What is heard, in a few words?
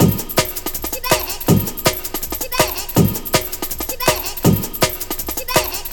162, Jungle Breaks; Jungle Warfare 1&2